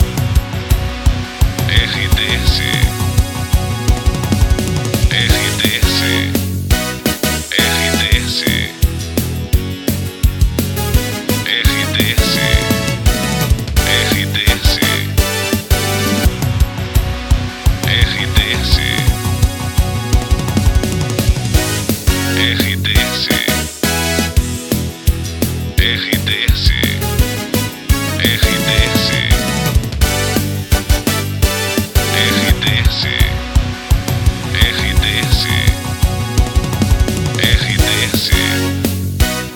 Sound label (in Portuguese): TRILHA PARA LOCUCAO WEB NET POPULAR